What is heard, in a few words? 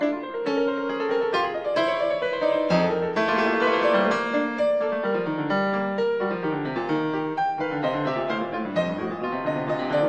Piano Sonata in B flat major Allegro risoluto Fuga a tre; No 29; Op 106; Hammerklavier